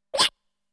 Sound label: Flattened; Namco Game Sound Express Vol.1; Valkyrie no Densetsu